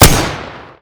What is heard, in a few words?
Deagle